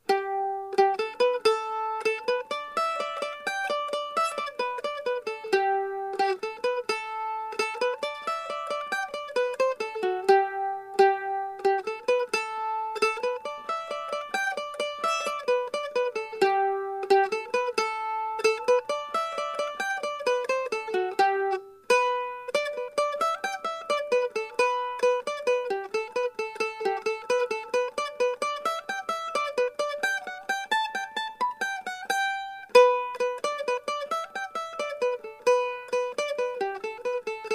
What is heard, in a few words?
jig